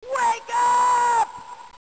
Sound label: wakeup